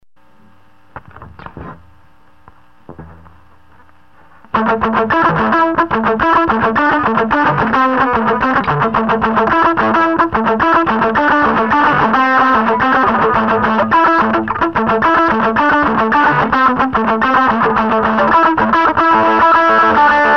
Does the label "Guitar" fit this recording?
Yes